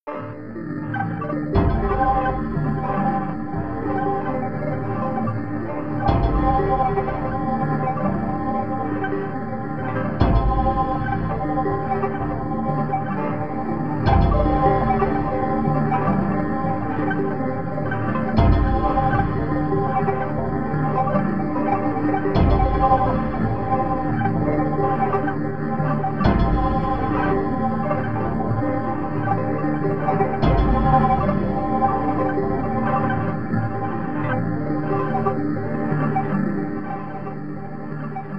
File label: Bamboo House